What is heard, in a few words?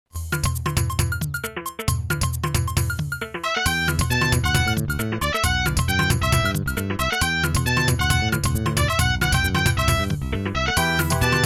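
Sony Ericsson ringtone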